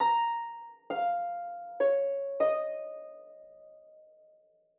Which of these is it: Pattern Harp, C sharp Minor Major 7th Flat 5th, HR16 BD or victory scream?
Pattern Harp